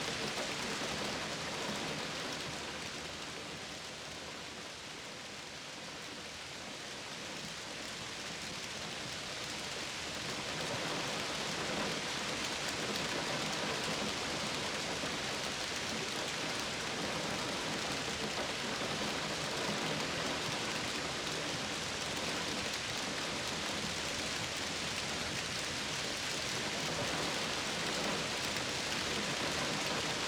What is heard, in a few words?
soundscape